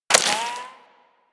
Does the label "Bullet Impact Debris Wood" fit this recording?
Yes